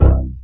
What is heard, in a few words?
DIRTY, Synths BASS